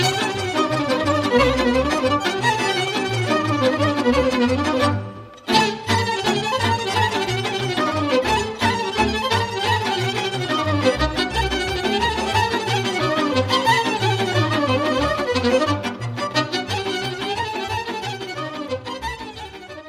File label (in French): Suita orchestrala din Banat - Roumanie